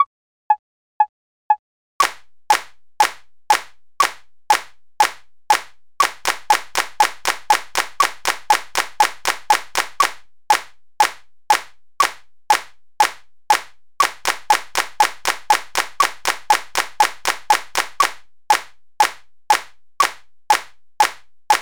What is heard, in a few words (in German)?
notenwerte 120, rhythmik